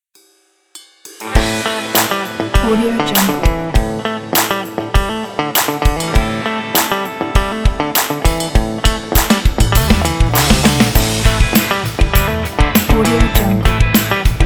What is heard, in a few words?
Fun Country Funk